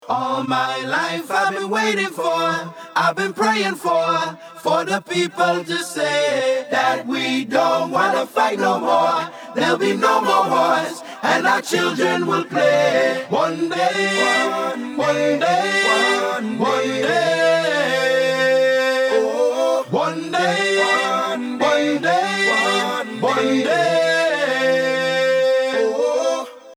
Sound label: bg vocals